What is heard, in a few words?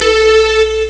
trumpets; ST-XX Amiga Soundtracker Sample Packs